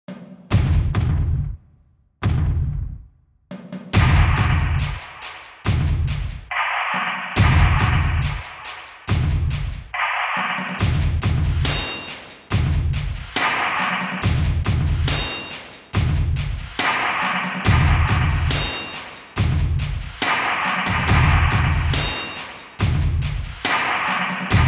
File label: Percussion Loop; Astro Flame: Starfighter; PS4, PS5, Switch, Xbox One, Xbox Series X|S